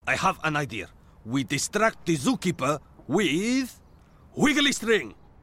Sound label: String